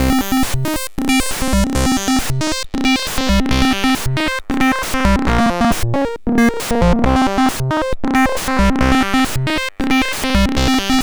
C64 Synth; 8-Bit; Chiptune; Commodore 64

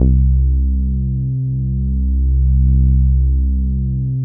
ALPHA B. C3, ANA BASS

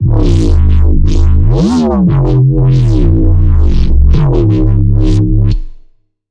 Neuro, bass

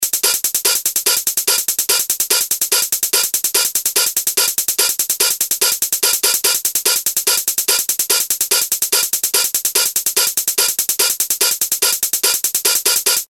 Typing Hats; Industrial

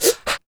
FEM PERC, Vocal Jazz FX

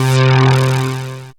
synth c, Dance Synths, Juno; ProSamples vol.37; Juno Jam; Best Service

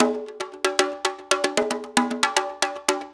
6-8 Conga Brush, Perc Loops; Drum Tools

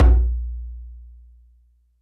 Djembe Open L; YAMAHA RM1X

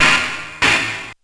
SP1200 trashy; E-MU Producer Series Vol. 8 – Platinum Phatt

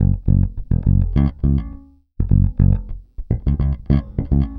Pop, Bass

